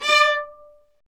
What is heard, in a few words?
STR, Vlas Marcato; STR Vas1 Marcato